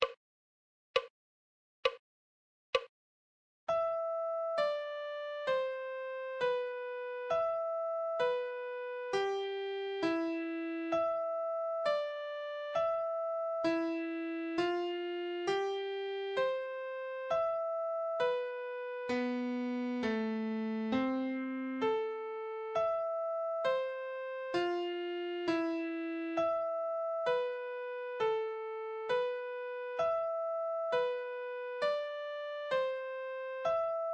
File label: musical reading in treble clef Adagio